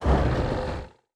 servo big, sci-fi sounds, mechanical

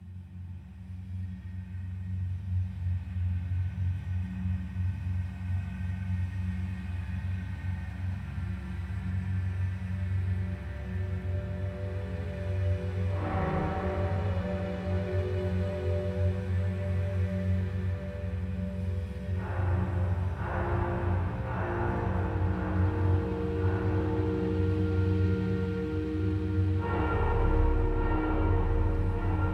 Ambience, Classic Horror Sci-Fi Music Pack; Dead Ark

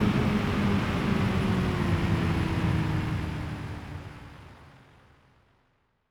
engine stop, sci-fi sounds, mechanical

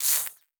Spark, Electric; Sci-Fi Sounds

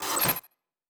Glitch, Electric

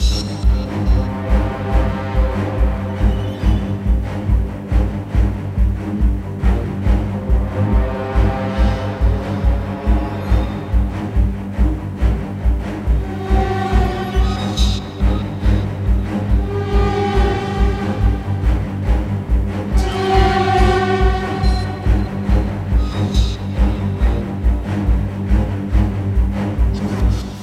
Action, Loop, 140BPM, Classic Horror Sci-Fi Music; Dead Ark

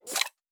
Weapon Foley (Rocket Launcher); Sci-Fi Sounds

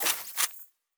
Weapon Reload

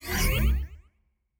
Teleport, Sci-Fi Sounds

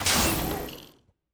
Door, Sci-Fi Sounds

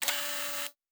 servo small, sci-fi sounds, mechanical

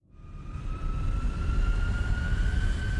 engine start, sci-fi sounds, mechanical

